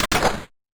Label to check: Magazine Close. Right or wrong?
Right